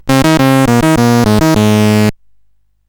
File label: CSG8580R5, GAMES; Spikes Peak